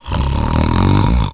Snore; Ganondorf snoring

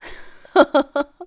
Greet Laugh; Mrs. Marie laughing to greet Link; Zelda

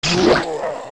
Stalfos Hit; zelda; OOT

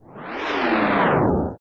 Shake; Morpha

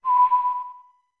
Whistle B med; OOT

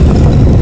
Trapdoor Slide; Trap door (Temple of Droplets) opening or closing

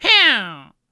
Hya; The Great Tripini: "Hya!"; zelda

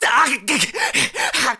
Link Hurt Bad Elec Fire; ZSS

